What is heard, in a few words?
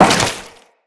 Crewman Step Goo, Footsteps; Enemies; Corpus